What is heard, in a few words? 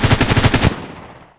mgun; sardukar; Emperor - Battle for Dune